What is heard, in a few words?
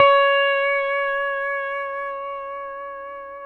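pedal steel C#5 right; PEDSTL NOTES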